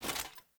Foley Action Armour Run; Player; Mother Armour

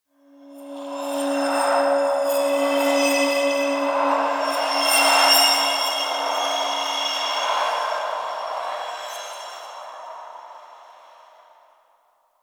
Interior Cave Spirit Gust, Ambience; Warframe; Duviri

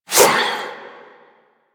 Army Replicant Proj Throw; Warframe; Warframes; Hoplite

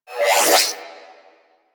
Alt Shot Stop, Weapons; Warframe; Tenno; Miter

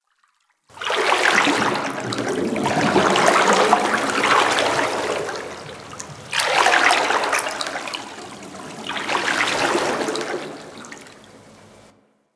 Idle Animation Water Turn; Ambience; Fibonnaci

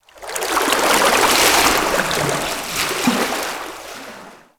Ocean Water Rising Laps; Ambience; Warframe; Grineer Ocean

